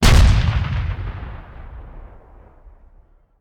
Artillery Cannon Artillery; Sound Ideas; Guns

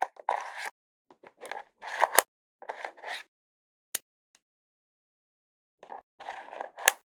toy gun clip in&out; weapons; NS Studios - ultra sound collection

